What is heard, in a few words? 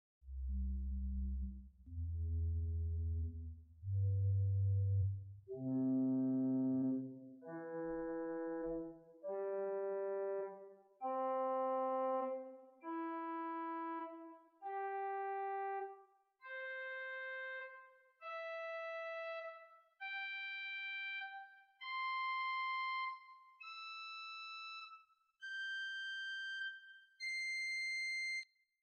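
Swell Viol arpeggio, organ; Prudhoe Methodist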